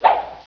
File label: SWAP; Zoemtonen hisses pingen woesjes tikjes